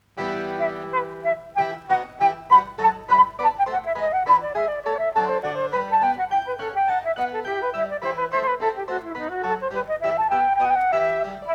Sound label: Creamsonata E minor - I. Adagio; Op.1,1 No.1